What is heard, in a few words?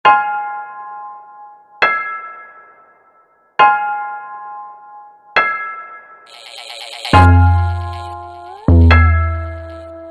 Instrumental